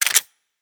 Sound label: Weapon Foley